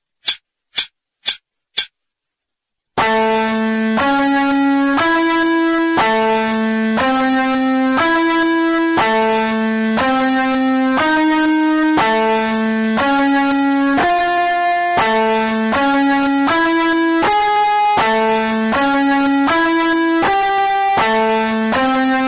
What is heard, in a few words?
Arpeggios In A, guitar